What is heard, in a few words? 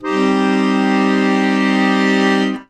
AKORDE C MOL; ACCORD.CHRDS